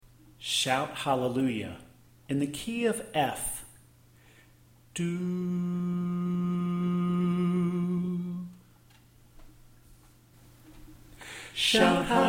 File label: Alto Left